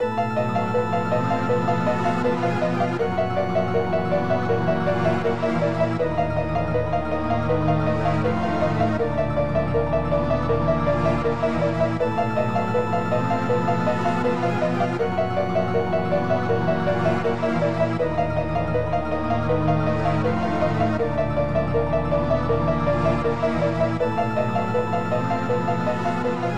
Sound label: Castle Theme; SMRPG; Koopa